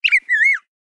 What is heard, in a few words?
fx bird chirps small